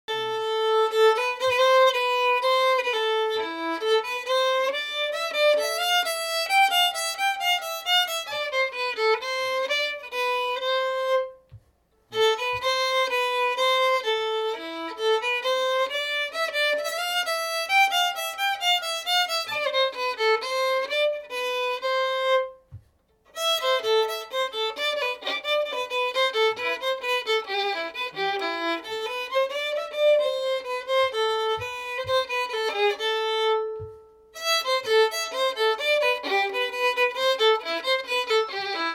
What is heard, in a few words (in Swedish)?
menuett